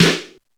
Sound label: snare, AKAI XE-8; Greytsounds